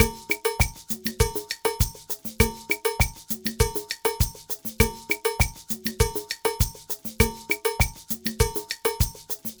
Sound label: Ensemble, Latin Percussion